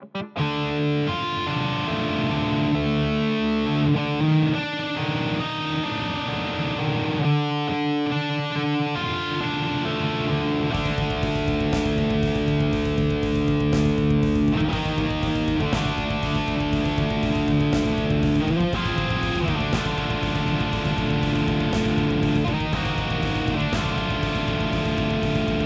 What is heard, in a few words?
Zoom G11 Metal